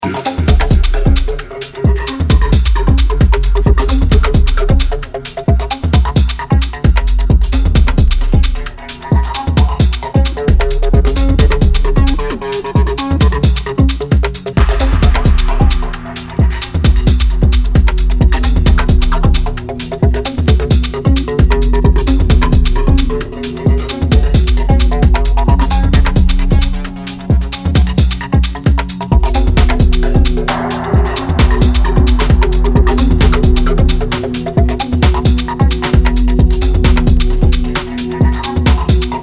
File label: Acid Flange